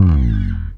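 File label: SB SLIDE, SPECTOR BASS; Northstar - New Gold VOL-1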